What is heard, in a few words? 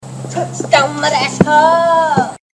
touchdown